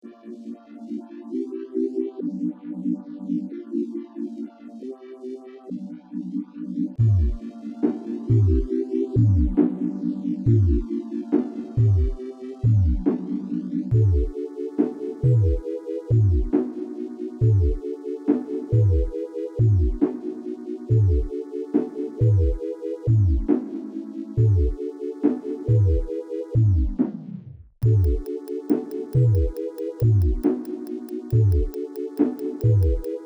sine song

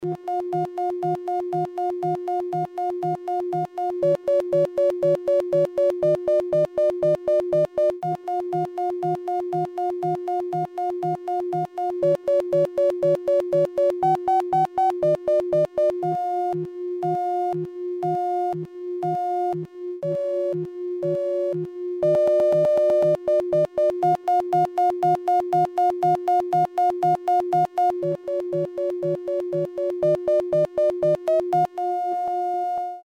1k Sine Wave

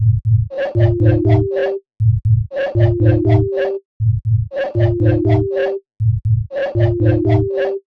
Sinewave

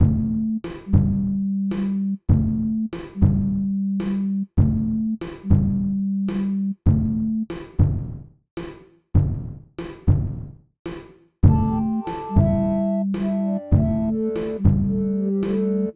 Sine Wave

